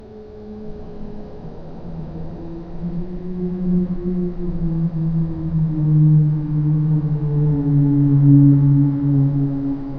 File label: flickering tube light soft